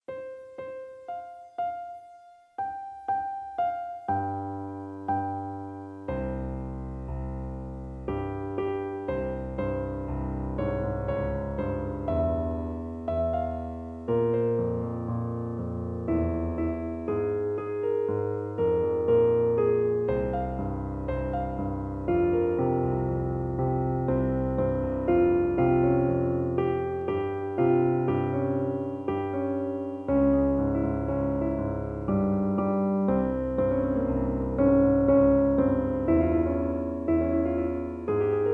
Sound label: Piano; Virtual Music Composer 4